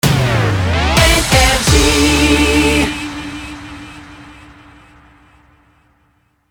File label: Jingle Rapid Fire; NRJ